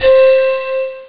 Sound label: flute; Winds